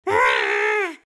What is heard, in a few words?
Hero cry; Troll Face Quest Unlucky; Android